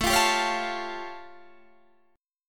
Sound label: A Augmented 7th A, chord; A+7 strummed; 17,20,17,18,18,17